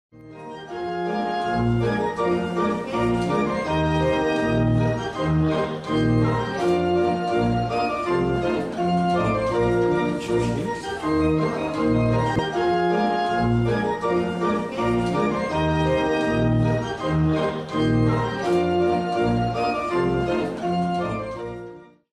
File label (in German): Polka